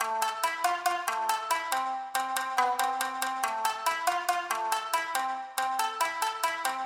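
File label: A minor 140 bpm; monosounds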